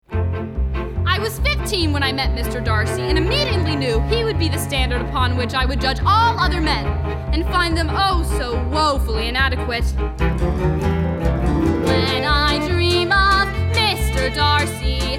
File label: Guide Vocals; Audition Cut